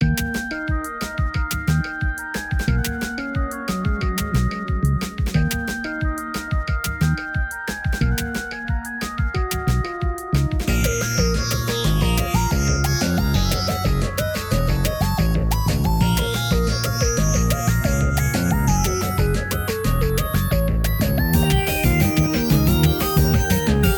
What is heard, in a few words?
Drum Beat; frogatto friends